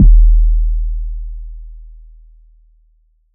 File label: puffy kick drum sound d sharp key; kick drum samples